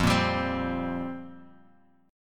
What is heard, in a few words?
F-Suspended 2nd Suspended 4th down Bass Standard, Fsus2sus4 chord; strummed; JGuitar